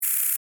Alien Insect Move, Scifi, Alien, Insect, Movement, Shake, Rattle, Buzz, Science, Fiction